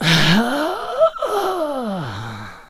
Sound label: Clubber Death Vocals